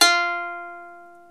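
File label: ethnic plucks; Eth Plucks, Pluck Duo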